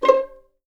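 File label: C5 pizzicato F, violins; East West; The Ultimate String Collection; VLNS PIZZ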